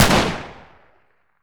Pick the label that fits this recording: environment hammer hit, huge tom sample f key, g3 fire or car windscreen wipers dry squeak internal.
g3 fire